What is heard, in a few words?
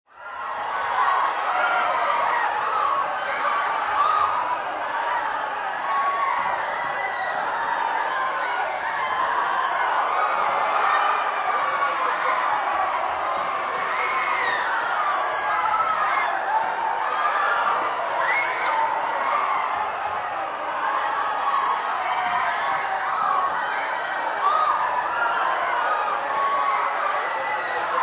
Crowd Riot Anarchy, Angry Mob, Protest, Screams, Pandemonium Outdoors; Crowd Sound Effects; Lynne Music